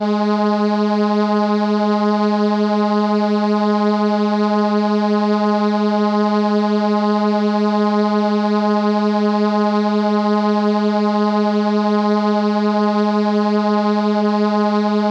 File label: Trumpet G#4, ARP Solina